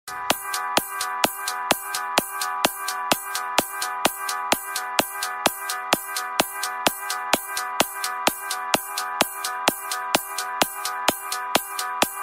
electro house; Shuffle Dance

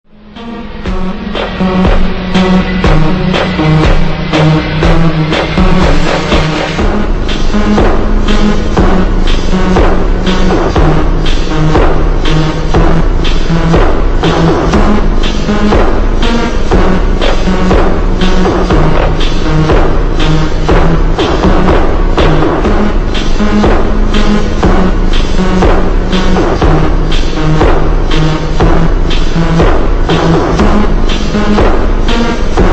slowed hard version loop

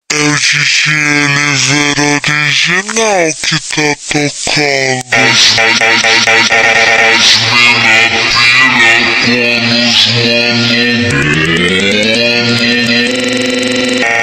super slowed reverb

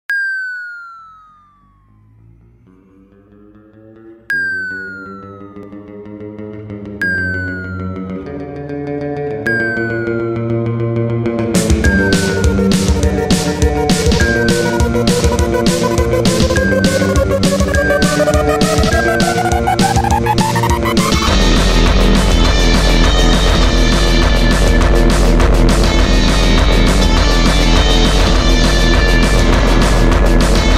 hardstyle

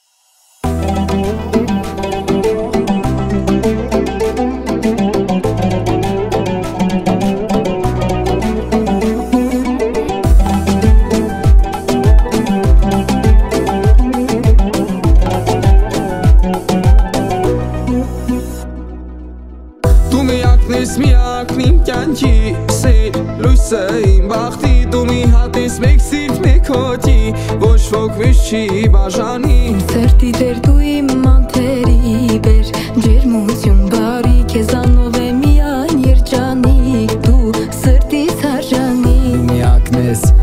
armenian low bass

